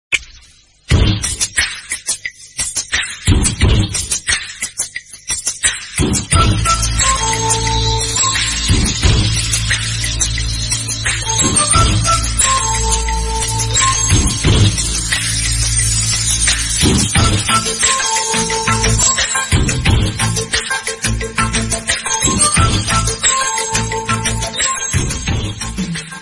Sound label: Bgm Ringtone